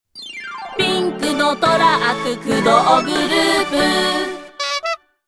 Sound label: sound logo